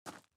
SFX run gravel

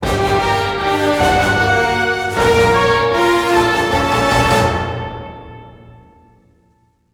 Sting; Evil Genius 2 World Domination; PS4; Xbox Series X/S

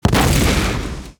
Explosions Explosion; SFX; EFX

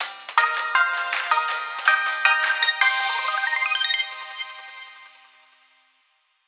winner jingle

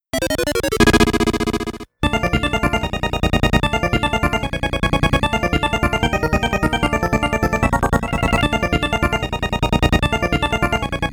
Challenger Appears

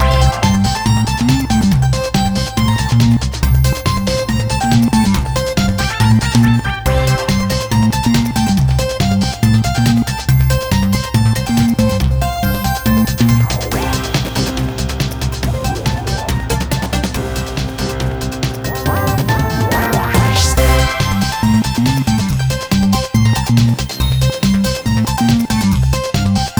Race Fast Loop; assault on metaltron ps4 switch windows xbox one gamerip 2019

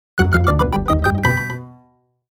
Puzzle Solved Jingle; legend of zelda the link's awakening

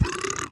Enemy Vomit Start

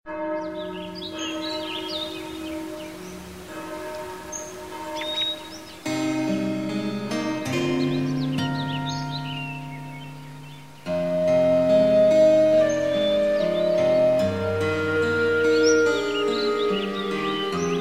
Peaceful Guitar (with ambient)

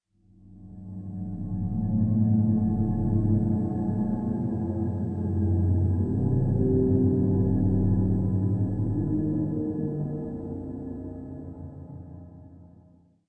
Amb Drone

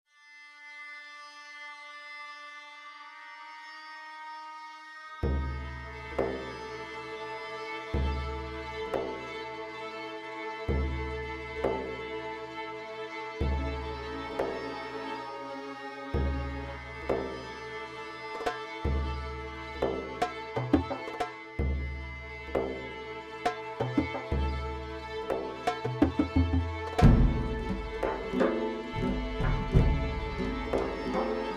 Wind Music; children of morta

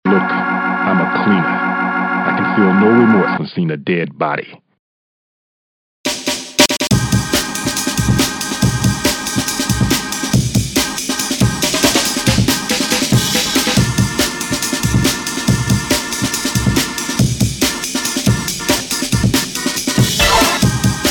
Cleaner Arpeggio

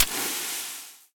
Warrior Acid Attack Hit; we are the dwarves gamerip 2016

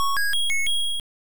Discovery Jingle; the legend of zelda nes

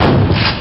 Shotgun

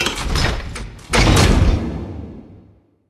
Hobbit Open door

